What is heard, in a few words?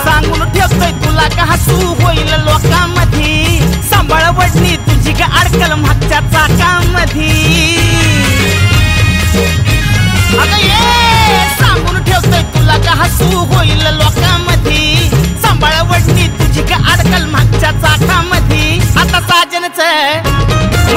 marathi music ringtone